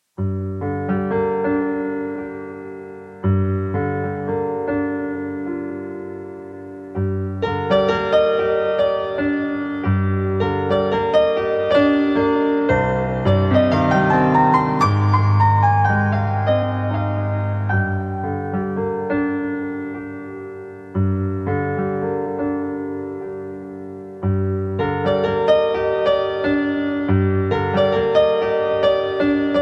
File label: piano music, 432 Hz; PAMIEC PRZESZLOSCI ODESZLA